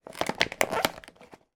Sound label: small plastic box packet open; foley; zapsplat